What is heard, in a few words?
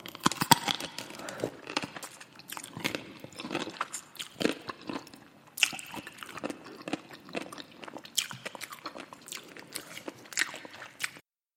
asmr